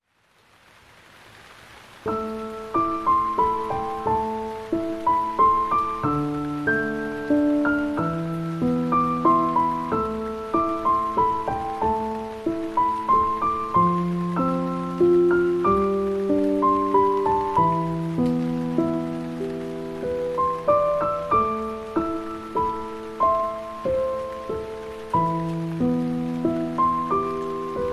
Into the Woods (With Rain), Calming Piano Music with Rain